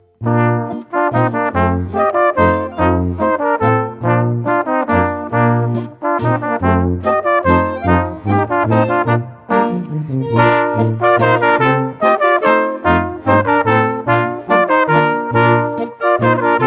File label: Polka Mazurka